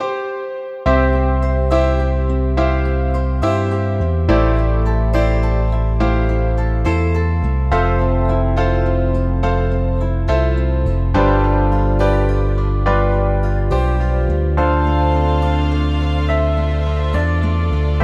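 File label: love no brass no drum; By Burak Sound Bank; mixed vol 2 evrensel karisik temalar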